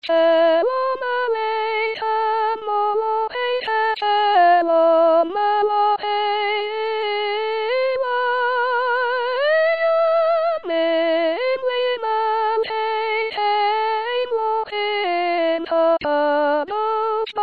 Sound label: Soprano; Shalom Aleichem